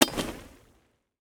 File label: Spinbutton; netent